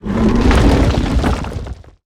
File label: Attack